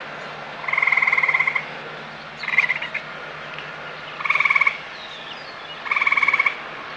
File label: bird